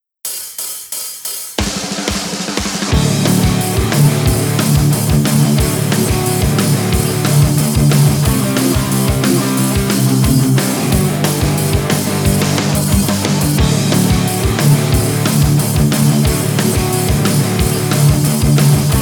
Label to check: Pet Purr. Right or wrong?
Wrong